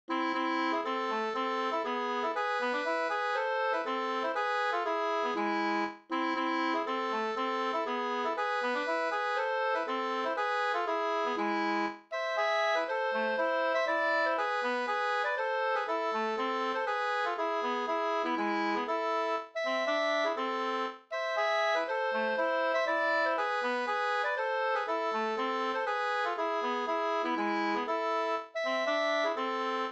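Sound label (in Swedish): polska, tvåstämmig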